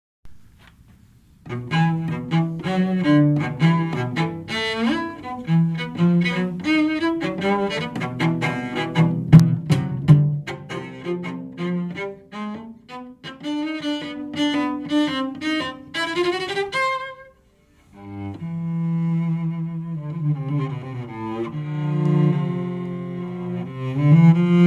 Violioncello

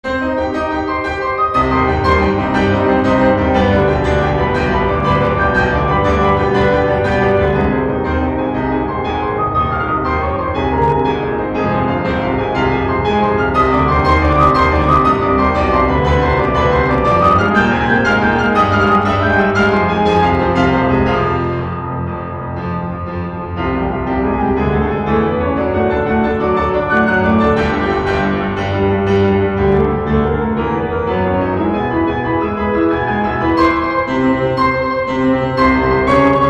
Etudes for Four Fingers C minor Octaves; Etudes for Four Fingers No.3 in C minor Octaves (updated)

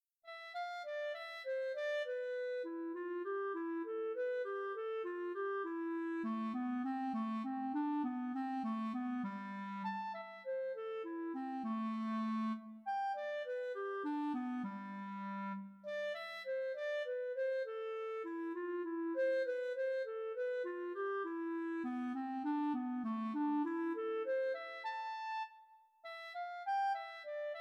Soliloquy for Clarinet

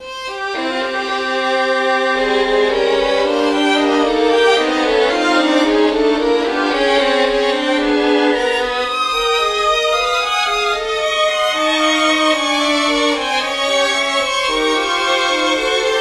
Quartet for Violins

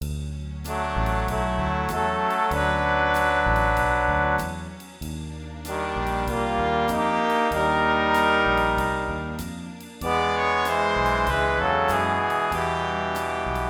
jazzy

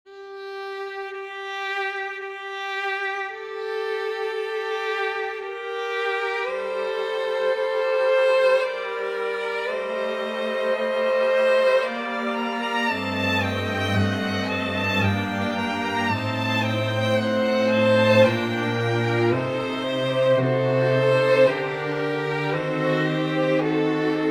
Canon a 5 in G minor, viola quintet; canon in g minor for viola quintet

